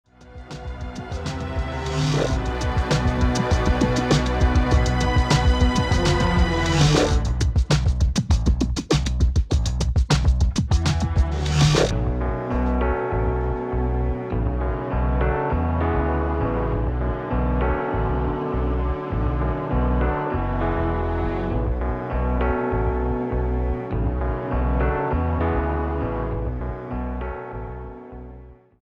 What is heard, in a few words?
ts pitch 3 minus